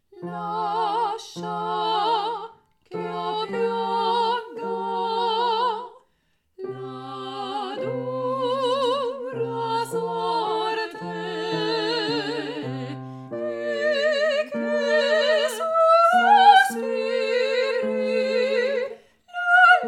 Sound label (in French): Soprano (avec basse); Lascia ch'io pianga; pupitre des sopranes